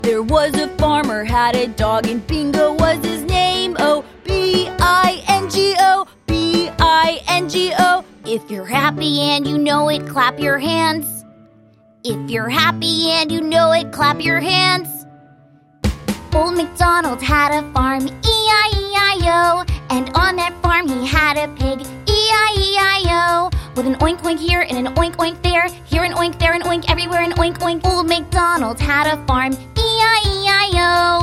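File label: American, Songs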